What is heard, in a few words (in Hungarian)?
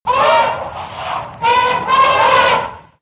elefant